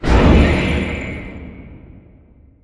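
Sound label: Prop Rack Start Distant; Prop Warehouse; Titanfall 2; Boomtown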